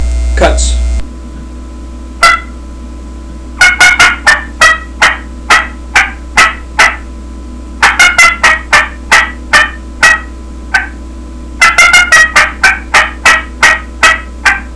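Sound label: jag edge cutts, turkey call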